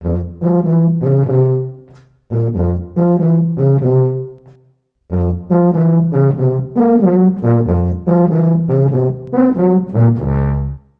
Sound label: Orch Chase Drama